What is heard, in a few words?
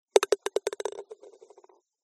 Golf Ball In Hole